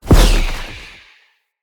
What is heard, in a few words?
flock projectile impact; Enemy; Abilities; Thawtide Hunter; Sinecure; Ranged Hunter Thawtide